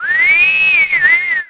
crowd; Tony Hawk's Project 8; XBOX; human

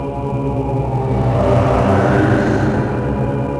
Chant; Ambient; MX vs ATV Unleashed

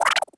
hit chirp, droids robots

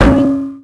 Mine Bounce; Finding Nemo; XBOX

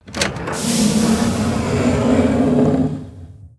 Control Case Close; Myst III Exile

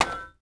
pipe hit creak; impacts explos

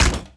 bad part metal

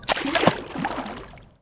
Med Splash, Dino

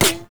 hit; Max Payne

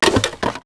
button lid open; Max Payne

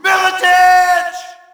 Shout, audience; UFC Tapout 2

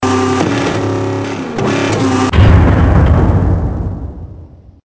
broken door; Soldier Of Fortune II Double Helix; XBOX

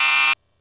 Car Warn; Midway Arcade Treasures 3

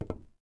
thug fs lMetal, footsteps

